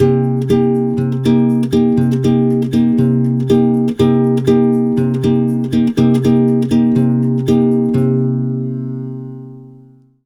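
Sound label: 120 guitar C minor, Brazil Bossa; USB Soundscan; AKAI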